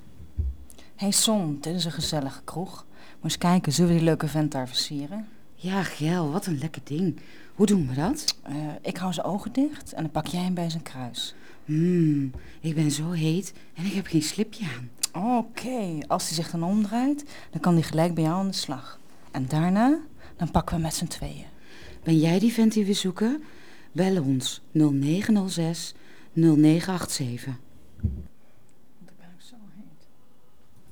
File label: Trio